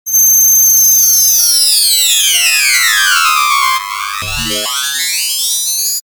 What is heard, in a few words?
high frequency cut